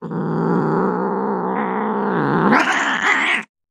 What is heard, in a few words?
Cat Domestic Growl